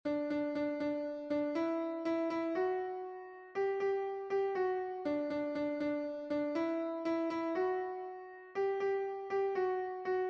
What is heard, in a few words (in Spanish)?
contralto, himnos en piano; cantos del camino